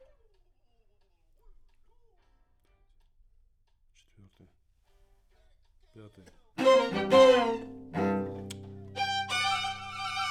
Alt, piano

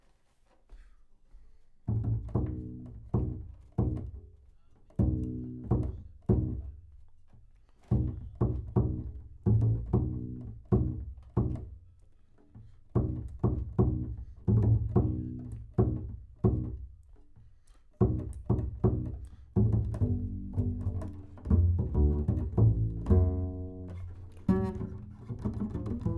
Bass

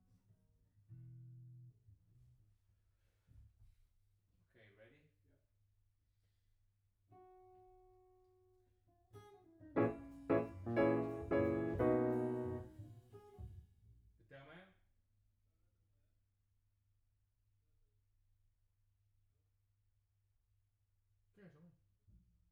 Piano B

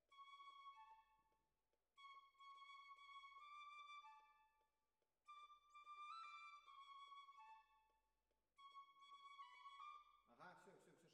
Violas, jazz for piano

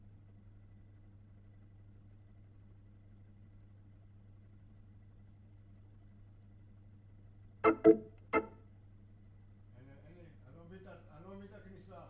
Hammond Leslie

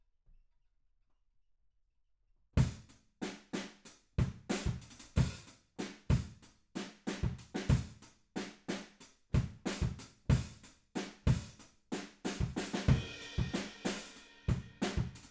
Room

